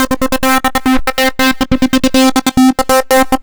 Arp 140bpm C, Trance; Arps; Zero-G Trance Inducer; 90 s Sample CDs

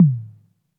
Toms, Elektron MachineDrum

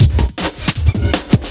LOOP FX, Big Beat Drumloops

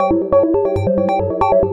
atmos, techno, 138, g; Techno ID; atmo+fx-loops

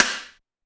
Rim, Pitched Up; Clavia DDrum-AT

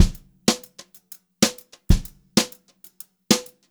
BEAT, Brushed Drum Loops; USB Soundscan vol.39; AKAI

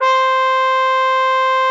C5, TRUMPET S; Xsample VOL-3

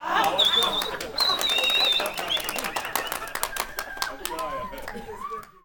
CHEERS; Spectrasonics; Heart of Africa 1

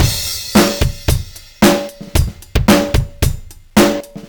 112, Beat Loops; Crush; Zero-G - Monster Beats

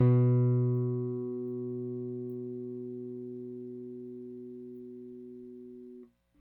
Rick Thumb A, Rickenbacker Bass; Airwindows

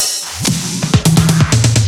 Fills 128BPM, Clubsounds

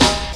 Snare, Percussion Hits, Snares

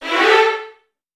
VN FX, Virtuoso Strings

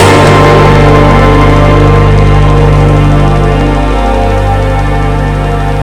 165, A, strings, drum & bass; Total Drum&Bass

